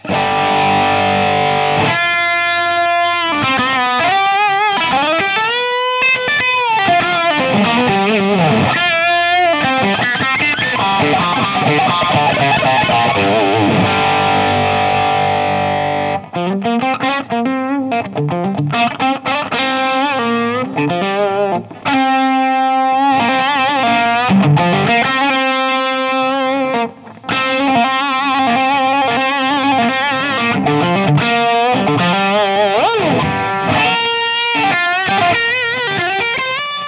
High gain lead Tele bridge pu Humbugging Modern Crunch channel gain 15; Joe Barden; treble 12